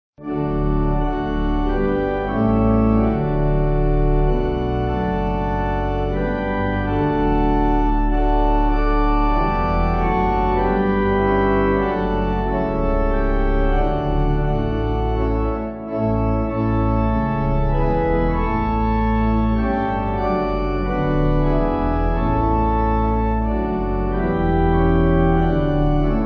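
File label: pipe organ hymn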